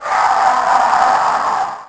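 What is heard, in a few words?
scream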